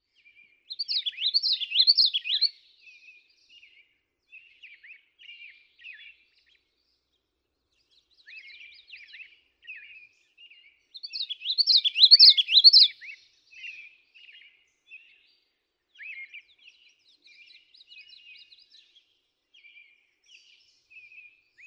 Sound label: Common Yellowthroat